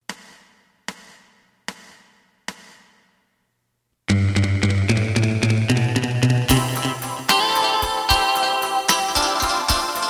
bass

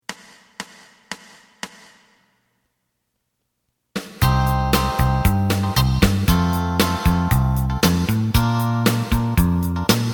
lead; エレキバンド用カラオケ

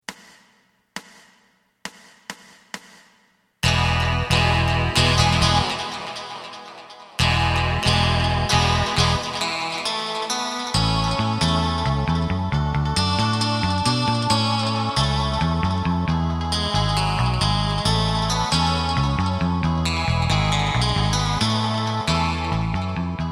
drum; エレキバンド用カラオケ

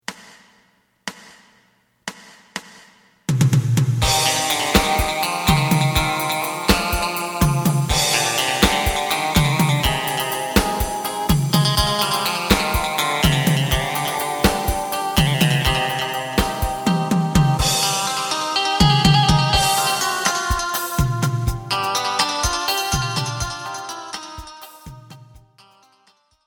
bass

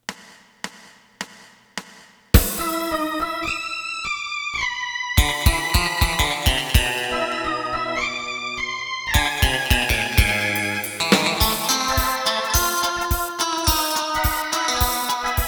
bass; エレキバンド用カラオケ

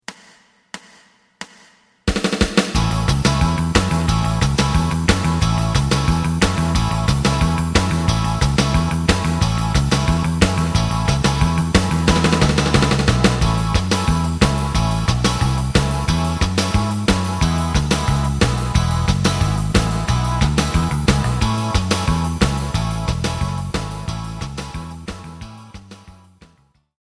lead; エレキバンド用カラオケ

